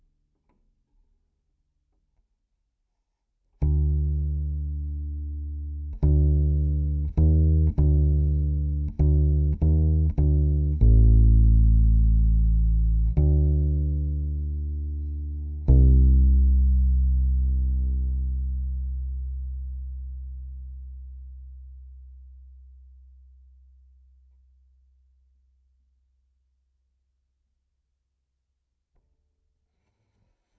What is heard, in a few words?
C Bass DI